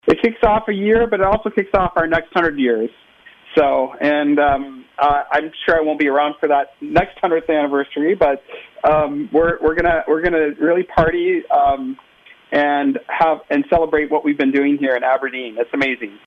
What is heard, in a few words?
Birthday Concert